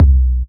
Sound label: kick drum sound c key